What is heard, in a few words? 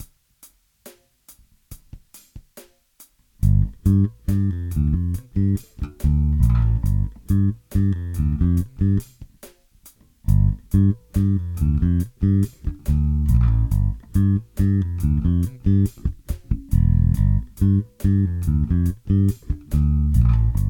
Bubinga Vette